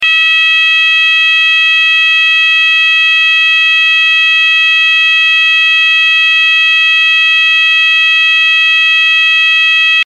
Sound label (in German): Ton